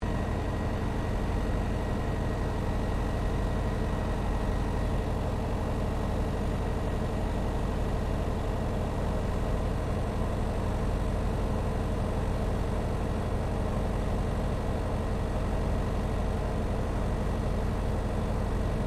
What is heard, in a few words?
Yakovlev Yak-52 idle high interior, Russian Yak 52 Training aircraft; Airplanes; Vehicles